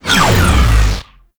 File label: moto race checkpoint whoosh; Warframe; Motorcycle Racing